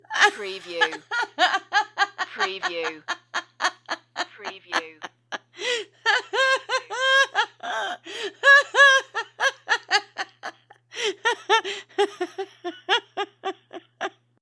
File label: Lady Laugh Long